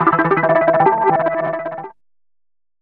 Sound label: DR. LOOP, TRANCE; X-Static Goldmine 3